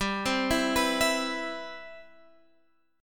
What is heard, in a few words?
C Major 7th, G, guitar chord